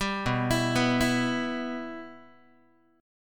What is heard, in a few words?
B Suspended 4th Sharp 5th down Guitar; Bsus4#5 arpeggiated; JGuitar